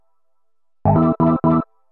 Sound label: HOUSE EXTRA, 125